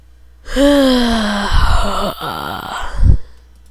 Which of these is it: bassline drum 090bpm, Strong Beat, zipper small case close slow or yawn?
yawn